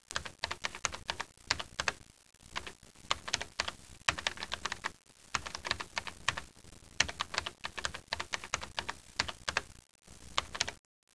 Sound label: typing